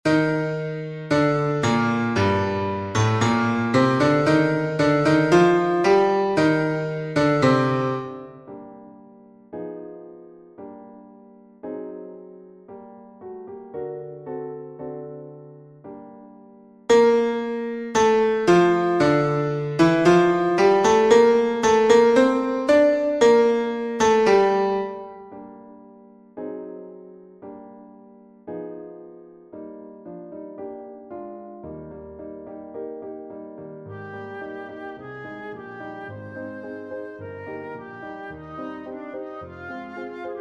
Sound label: intermezzo for flute piano; bizet georges; from 039 arla sienne